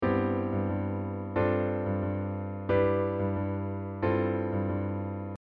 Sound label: Bass Pedal